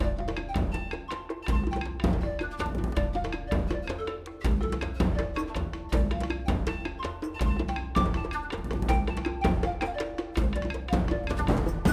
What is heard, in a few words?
ambience Free Spin; wild turkey